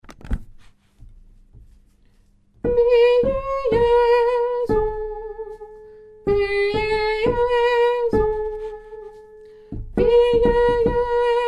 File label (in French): lettre B tenor; chorale